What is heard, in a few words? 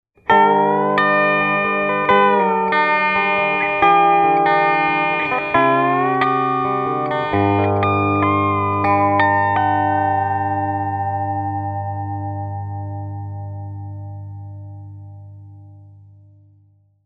Chimed Harmonics